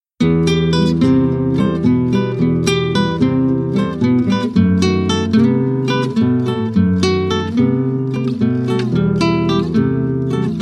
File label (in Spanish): violin